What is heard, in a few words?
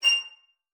F7, VIOLIN SPICC; Xsample VOL-5